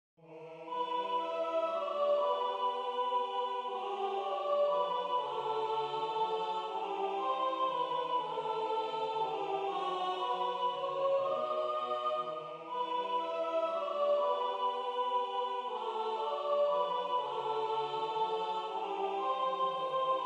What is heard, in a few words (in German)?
Sopran; Greensleeves Teil 2 (Takt 42 bis Takt 74)